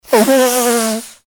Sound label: snooze